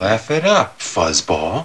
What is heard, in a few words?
laugh fuzzball; starwars; empire